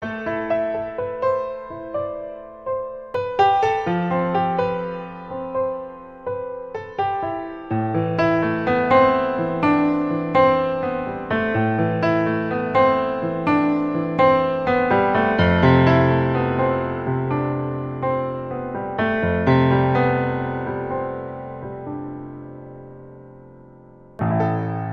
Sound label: Introspective Piano Etude